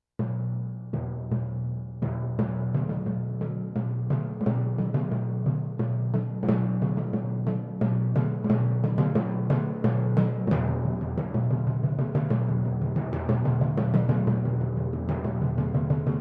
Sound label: timpani